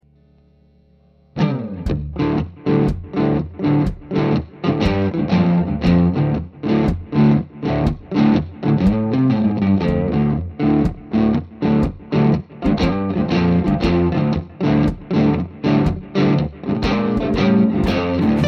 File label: trains